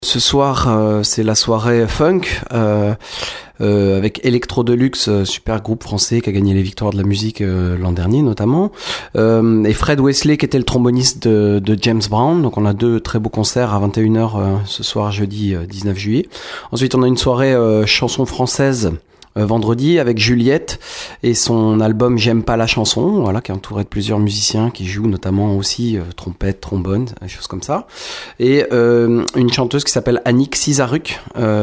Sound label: brass; surgeres